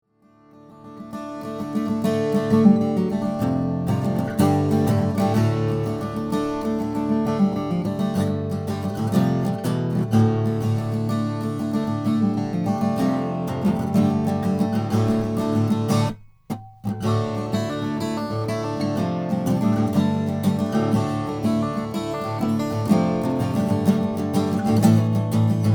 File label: lowden; hondura; pierre bensusan signature